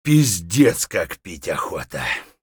dehydrated; Escape from Tarkov

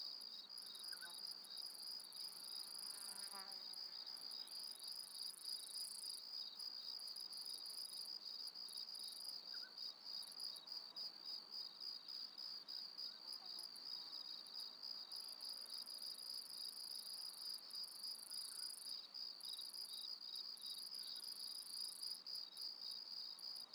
ambience crickets; Rust

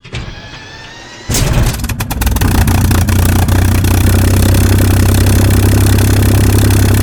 Aircrafts Engines Packard Merlin Prop Start; DCS

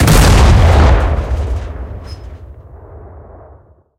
cannon 130mm b13 shot, tanks; cannons; War Thunder

